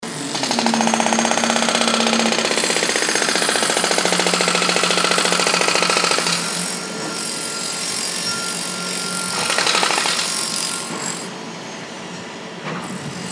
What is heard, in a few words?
construction site atmo